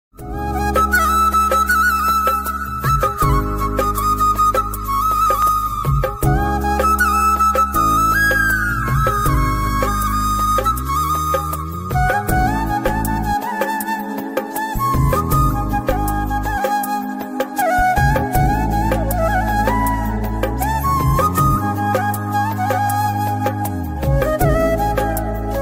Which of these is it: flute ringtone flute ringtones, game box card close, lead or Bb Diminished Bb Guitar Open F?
flute ringtone flute ringtones